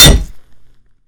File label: crowbar hit; melee